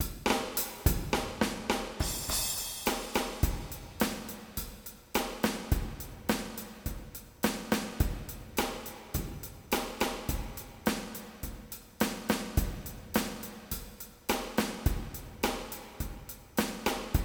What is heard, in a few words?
Drum riff